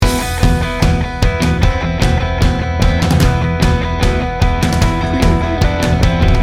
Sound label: tribal rock loop